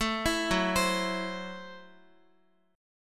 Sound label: Gb Minor 7th Flat 5th, Guitar; Gbm7b5; arpeggiated